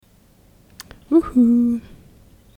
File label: Ouhouh